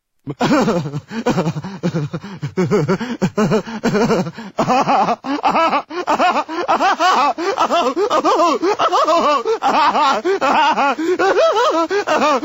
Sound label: Laughs; Stewie Griffin